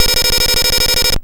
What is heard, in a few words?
Arcade Riffs